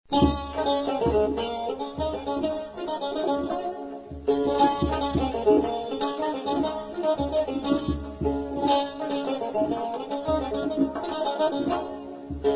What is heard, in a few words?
Chahargah